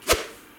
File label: Swish